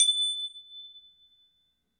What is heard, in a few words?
glassian, carillon; Spectrasonic Distorted Reality 2